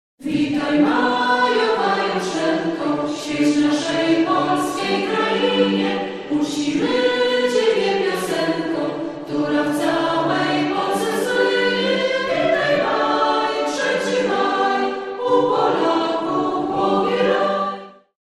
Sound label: acapp